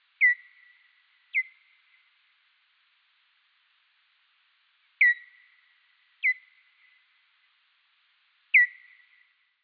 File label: pipistrelle